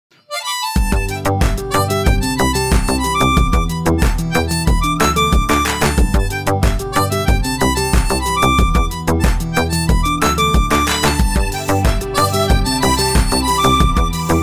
Happy Harmonica

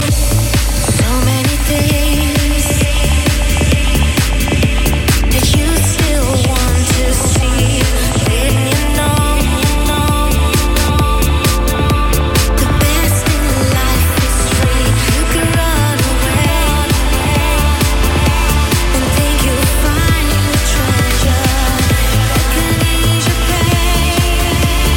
Trance

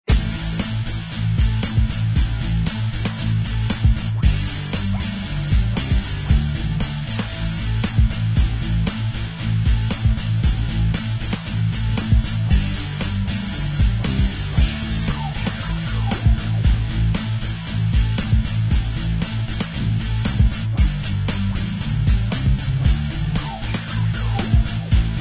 Bass Guitars

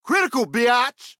Roland Sms

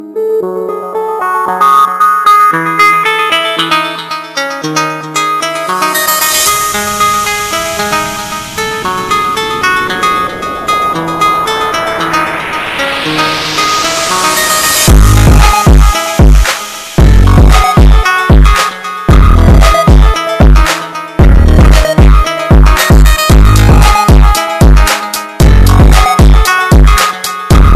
Dj Beat

